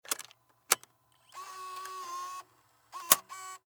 Technology Dv Camera Eject Tape Panasonic Dvx 1080 Camcorder